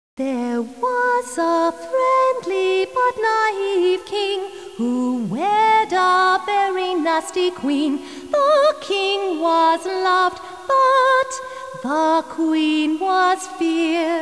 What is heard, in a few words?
berceuse singing